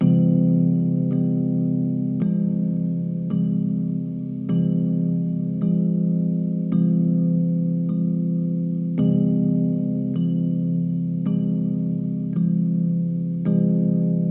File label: Rhodes version